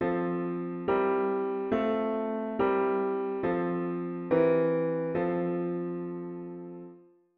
Harm Dict diat inv V7 inv bass

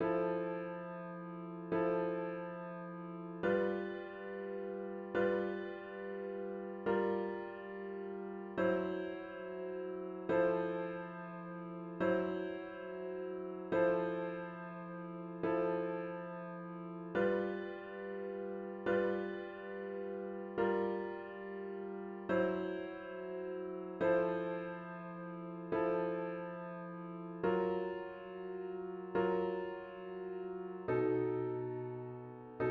chords guide tones

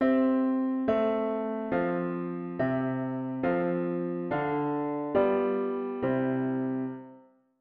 root V7 bass

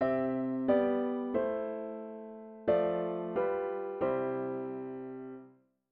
chord prog chunks diat inv and V7

